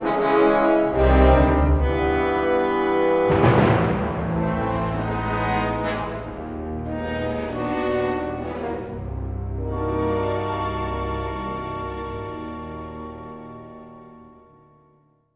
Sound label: sighting, music flourish